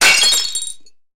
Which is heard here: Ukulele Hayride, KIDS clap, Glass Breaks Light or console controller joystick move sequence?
Glass Breaks Light